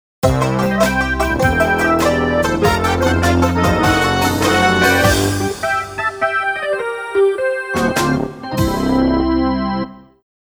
Desert Zone Completion; Frogger He's Back

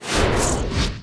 Sfx Fadeout; rayman jungle run android ios mobile windows gamerip 2012

